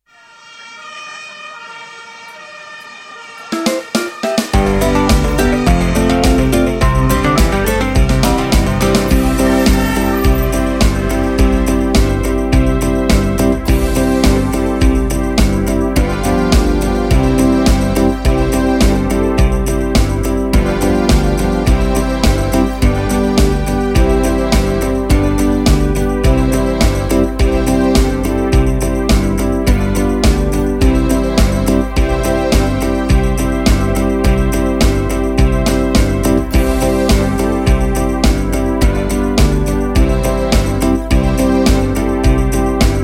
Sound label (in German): feuerwehrlied karaoke